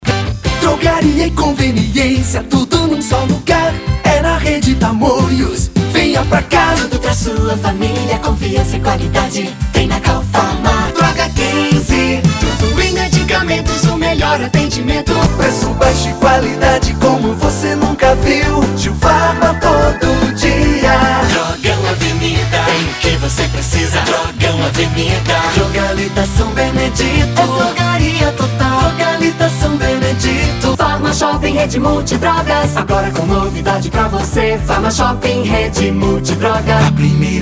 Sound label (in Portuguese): jingles drogarias; mundi drogarias